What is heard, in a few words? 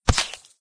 Skyhook Contact; Doodle Jump 2; Android